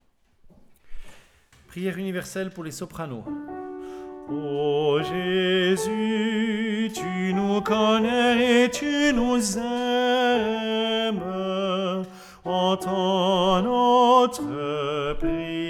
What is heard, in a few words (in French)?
Chants Priere universelle